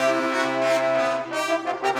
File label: Brass; Sonic Foundry (Sony Creative Software); Orchestral VOL-2; Modern 16